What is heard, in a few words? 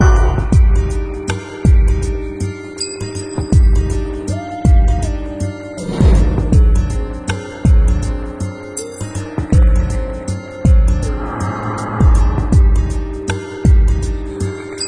Dungeon loop